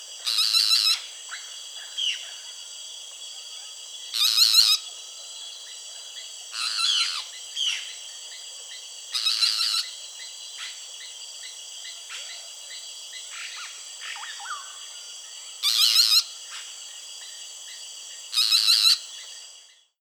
neinei canto

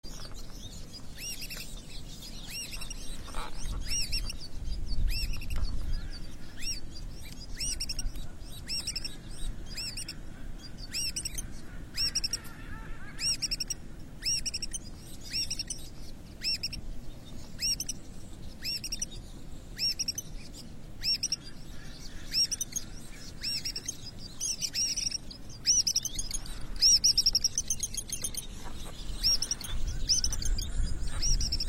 canto da perdiz do mar escura

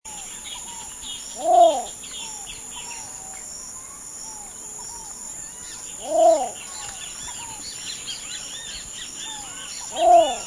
canto do socoi vermelho

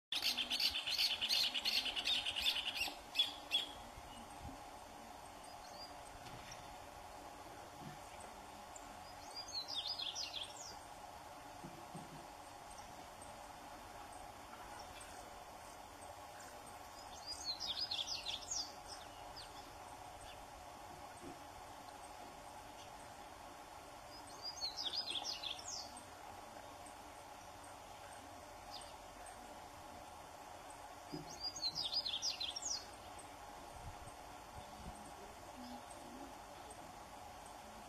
Canto do beija flor manga de peito preto

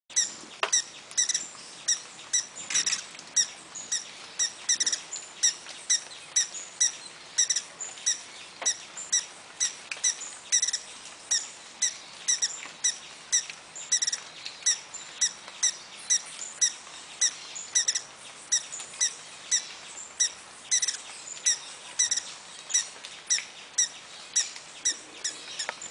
canto do beija flor rubi

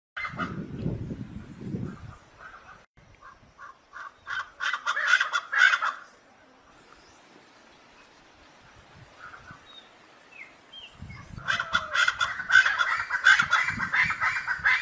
canto do aracua de barriga branca

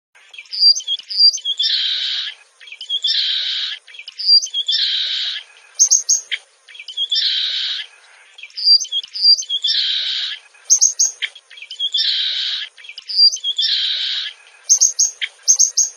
Canto do irataua pequeno

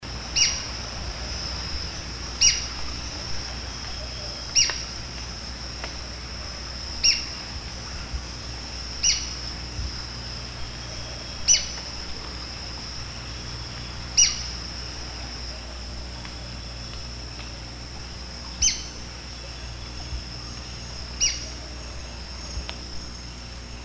canto do patinho de coroa branca

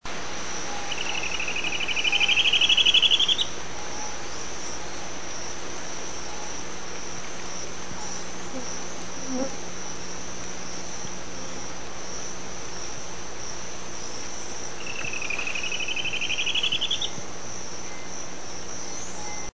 canto do chupa dente de capuz

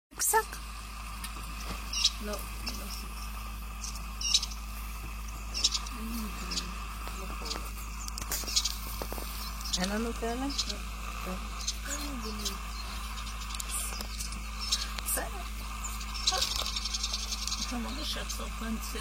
canto do abibe preto e branco

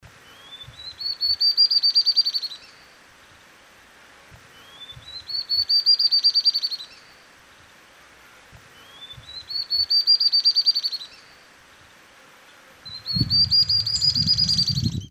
canto da garrincha chorona

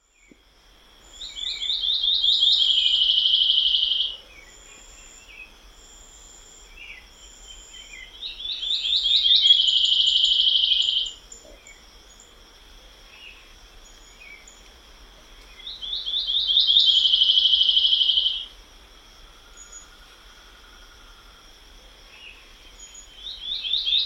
canto da policia do mato

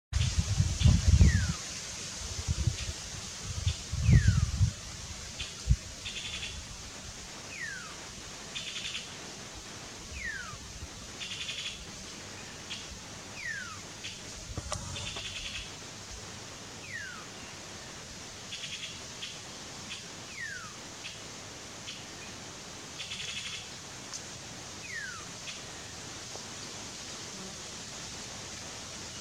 Canto do papa formiga barrado

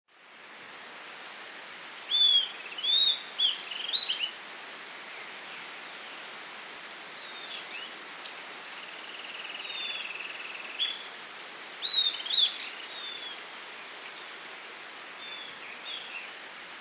Canto do estalador

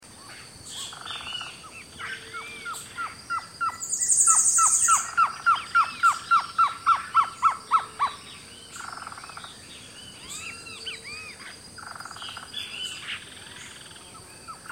canto do surucua variado

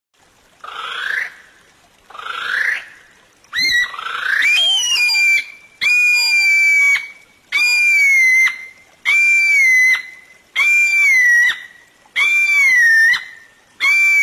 canto do passaro kiwi

